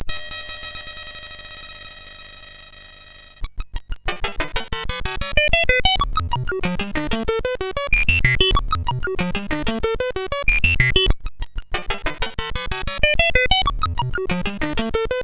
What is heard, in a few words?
Spin, MOS6581R2